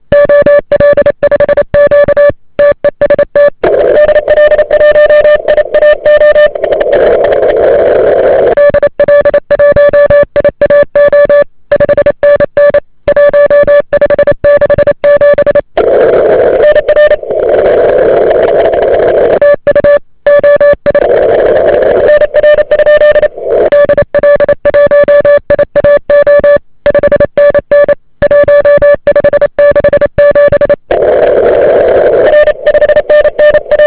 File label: cw; wpx; ol5q